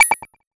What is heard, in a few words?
Coin